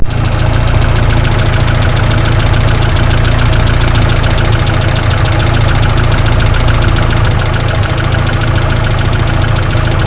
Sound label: lifter tick